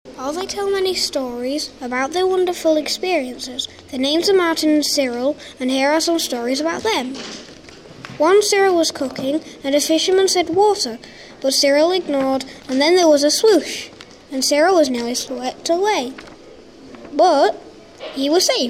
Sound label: Sea Soundscape